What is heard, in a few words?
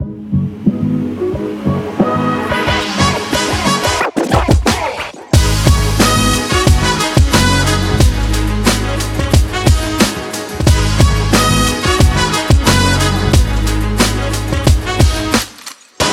hip hop action kit